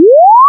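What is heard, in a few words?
powerup, Power Up, Digital, Game; 0.5s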